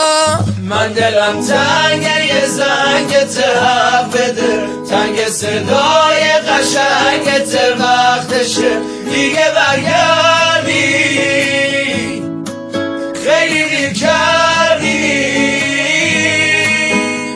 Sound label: deltang guitar